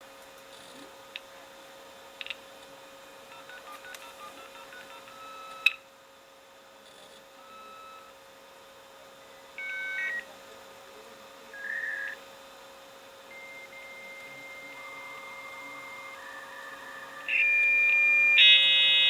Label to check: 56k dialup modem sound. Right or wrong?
Right